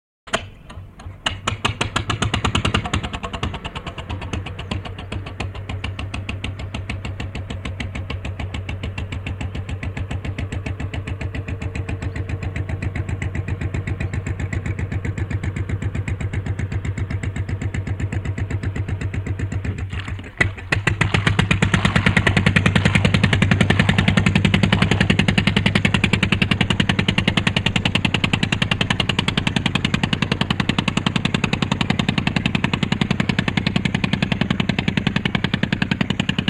Boat with Engine; Start / Away; Fishing Boat Start Up, Idles, And Chug Away To Mid Shot And Then Switches Off.